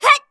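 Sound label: MON ATTACK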